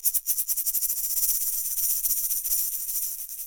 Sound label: chicken shake